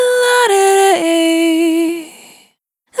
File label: Single Voice Melodic Loops, Vocal, Dry